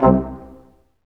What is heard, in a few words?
BRS Quintet staccato; Roland L-CD702